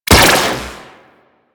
Dispersion Laser Fire; Riot Moa

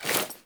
Foley Action Default Melee Swipe; Characters; Player

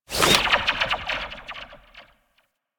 Zipline Release; Gadgets; Parkour; Warframe

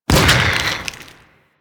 Inf Throwing Star Explosion, Infested; Warframe; Weapons

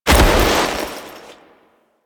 Foley Roll; Warframe; Kahl

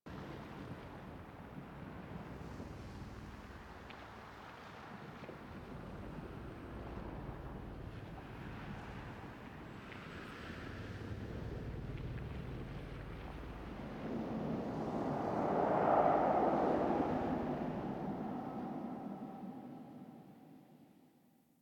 Throne Room Intro Ambience; The New War; Sentient; Operator Intro

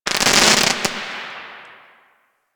Firework Crackle; Fireworks; Warframe

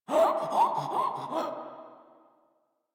Infested Runner Breathe Quick; Warframe; Runners

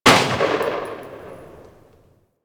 Lever Action Pistol Tail; Grineer

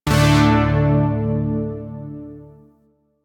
Shawzin Chromatic Chord; Zariman Shawzin

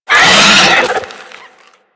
Infested Biped Pain, Enemies Infested Moa Vocals; Warframe

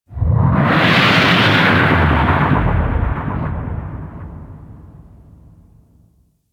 Ocean Kraken Bellow; Warframe; Grineer Ocean

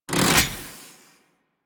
Face Reveal Close; Warframe; Fortuna

